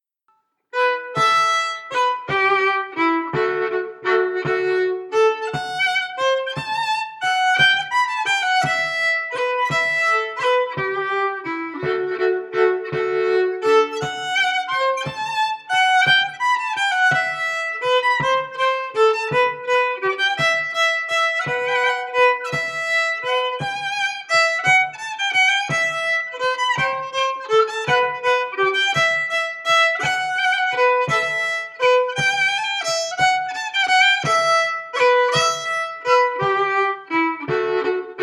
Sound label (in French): Valse